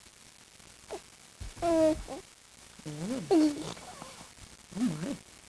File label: fricative